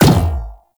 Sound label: Alt Fire Projectile Bounce; Weapons; Warframe; Corpus; Tenet Quanta